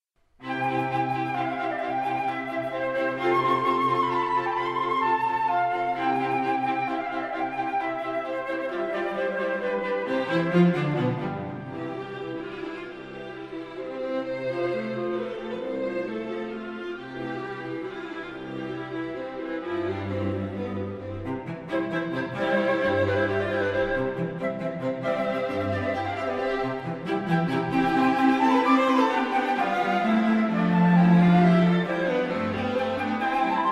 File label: mozart flute quartet